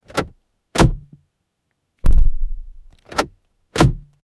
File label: car door close sound; transportation